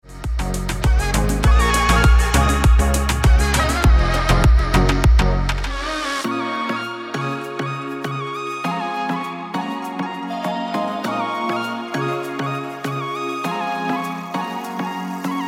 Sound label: Beach Vibes Ringtone